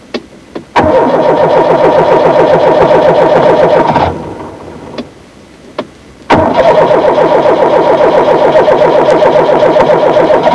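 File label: starting; Transportation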